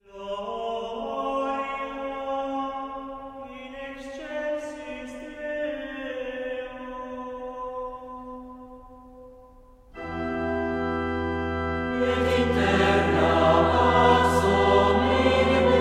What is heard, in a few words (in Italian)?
voci